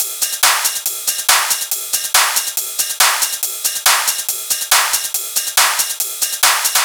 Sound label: drum Loop 140BPM Full